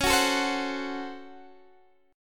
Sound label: Db Major 7th Suspended 4th Sharp 5th Db Guitar Standard; x,16,16,14,13,14; DbM7sus4#5 strummed; JGuitar